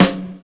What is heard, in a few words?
BIG ROLL; Univers Sons - Kitch 70s